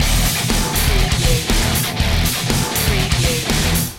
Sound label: remix loop